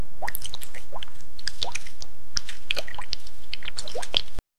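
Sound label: swimming sound for a player, gameplay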